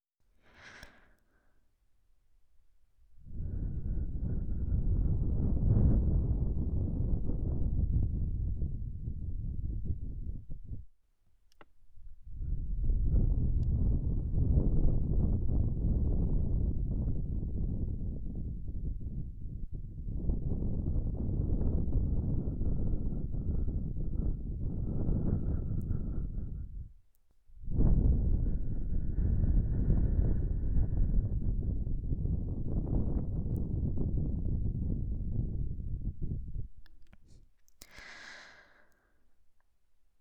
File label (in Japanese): 耳ふー吐息耳舐めループ右, 中性囁き; 横に寝ながら聴く、片耳特化のずっと囁きゾクゾク安眠耳舐め。